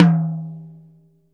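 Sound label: jazz drum tom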